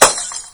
glass; super trench attack 2

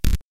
splat; Dino Run

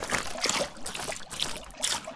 water walk

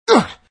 Collision; Sonic.EXE

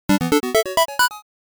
Puzzle; No More Heroes 2 Desperate Struggle

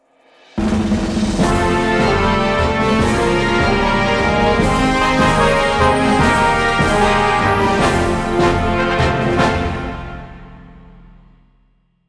Music Intro

